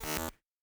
Glitch

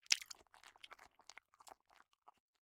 Eat; Mita; miside demo windows gamerip 2023

